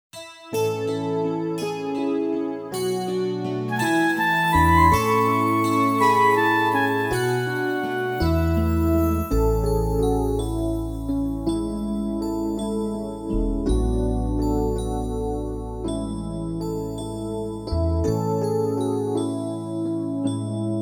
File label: Backing